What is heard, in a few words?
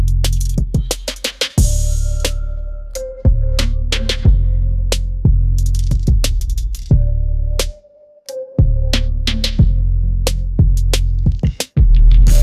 west coast midnight drive